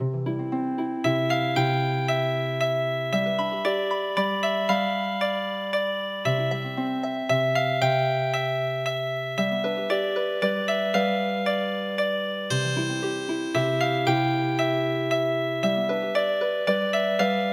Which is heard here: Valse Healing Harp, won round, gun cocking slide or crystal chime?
Valse Healing Harp